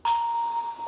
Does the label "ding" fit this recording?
Yes